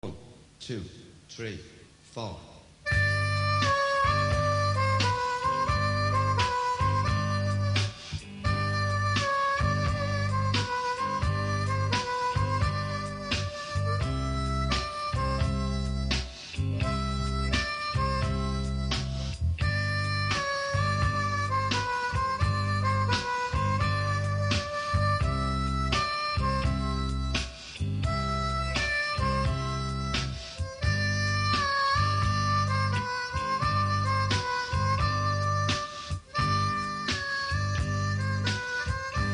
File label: harmonica; don baker exercises